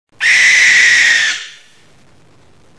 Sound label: barn owl, birdsong